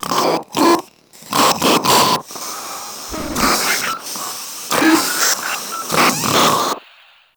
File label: Proto Infested Runner Idle; Warframe